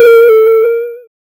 a monster hurt pixel sound

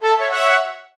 achievement

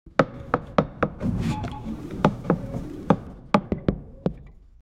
egyptian chest open with, mysterious

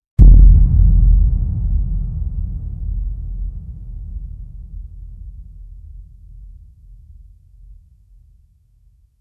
heavy thumping sound with; rhythmic

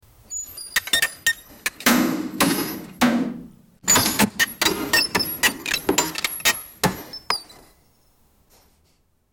different robot arms putt, robots

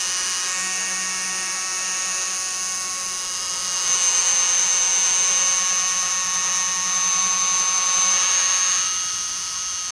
noiseless loopable, electric scooter

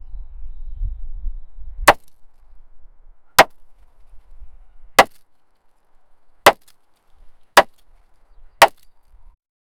wood

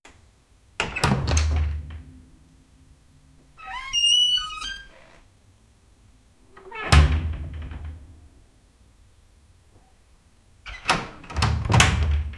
the sound of an old, furniture

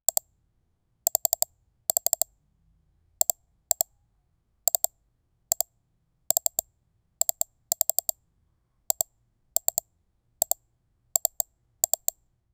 sound of mouse clic

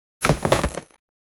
chest item reveal mobile

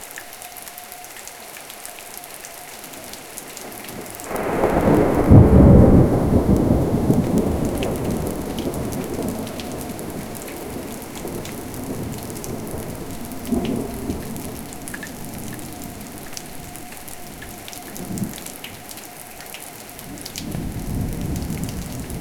rain sounds of thunder lo

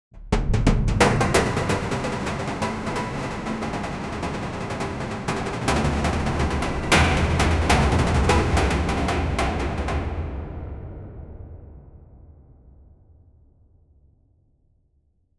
rhythmic buildup metal

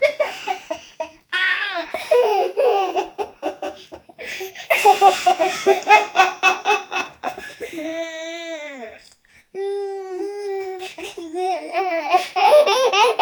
human

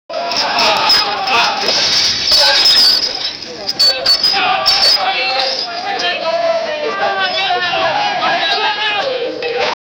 prisoner being led into a, prison

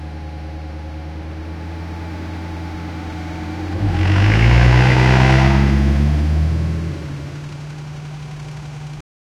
toyota avensis engin; 2010

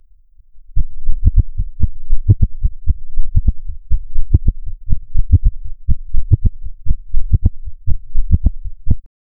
low pulsating heartbeat

